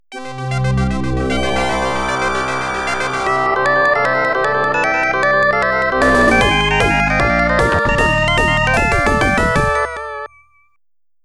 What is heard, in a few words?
Game Start; Galaga '88; X68000